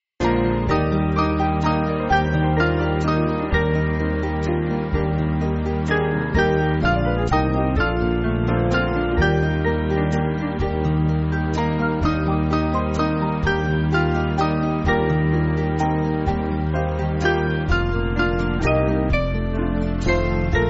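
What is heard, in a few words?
Band, Backing Files for Churches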